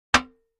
Aluminum Pan Single; barrels buckets & cans

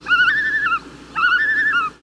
Loon Flight Calls

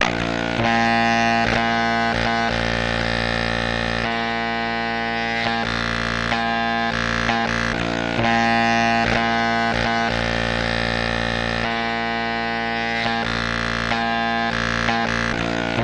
Elect Hum Jamming, buzz & hums

